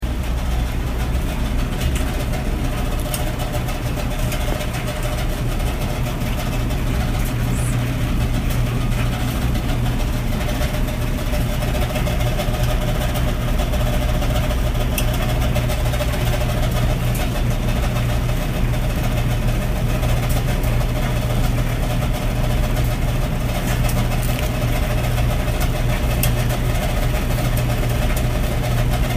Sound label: Dryer; Basement of my house – 02/28 – 2:56pm; Field Recording